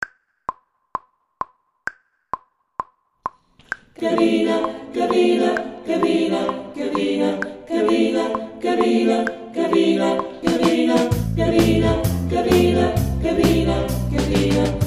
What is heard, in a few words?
vocals main riff